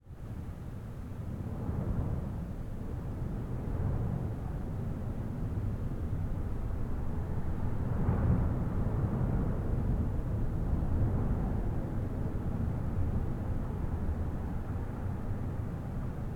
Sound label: Wind, Interior, Rooftop, Soft, Whoosh; toneglowlibraries